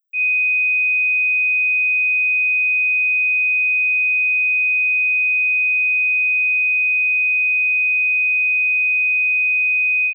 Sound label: 2.5 kHz